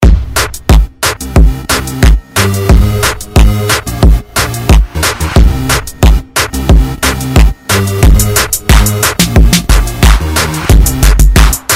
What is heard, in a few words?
hip hop rock